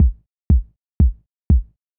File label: Kick Lite; hydrogen